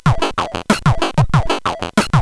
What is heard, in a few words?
Scary Sequence, CSG8580R5; hvsc